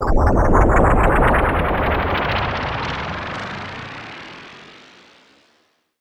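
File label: endings outro, disappearing fading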